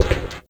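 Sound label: noise; Sonic Foundry (Sony Creative Software); Esoterik Beatz; Saver FX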